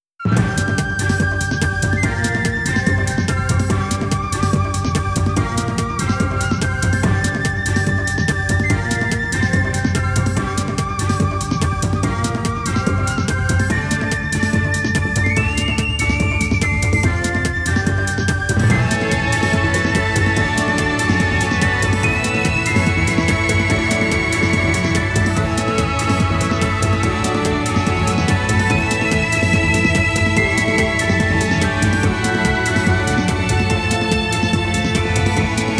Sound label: Dragon Horse Racing Practice (Minigame BGM); Genso Suikoden V Original Soundtrack